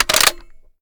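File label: sniper reload empty fast bolt open; gmod; iw8; alpha50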